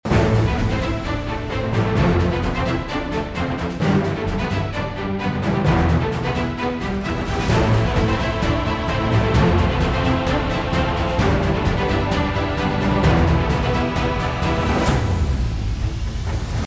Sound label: sign up